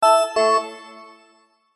multimedia alert notification bright organ bell like musical complete end